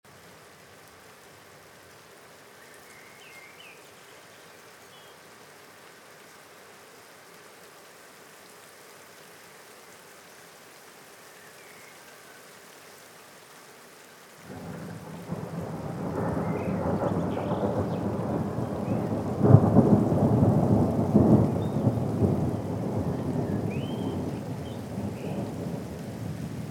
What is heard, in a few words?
Storm And Rain